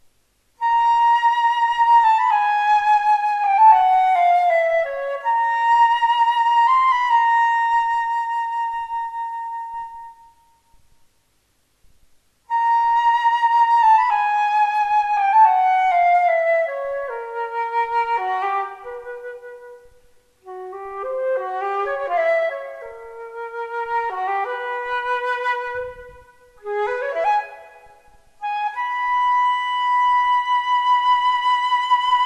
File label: Solo for flute; Debussy